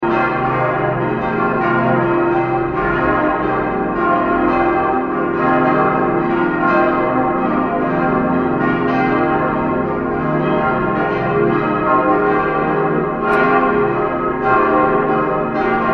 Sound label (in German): gelaeut voll; andreaskirche